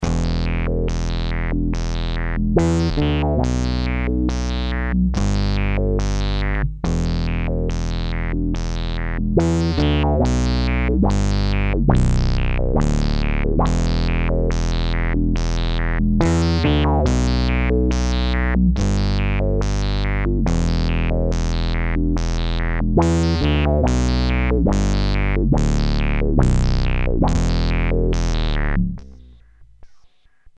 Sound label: bass; octavius squeezer; FreakDownstairs